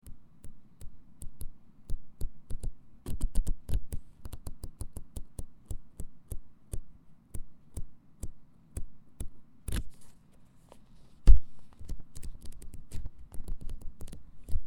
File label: paper flip